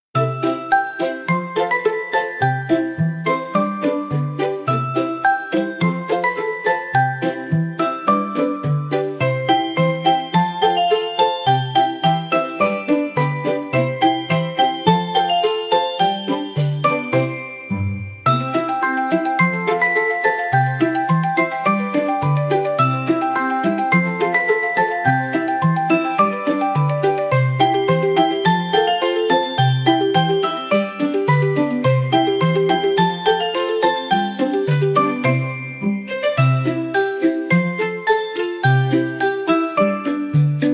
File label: Baby Snow Lion Dance; GAMCHUNG LIB; Children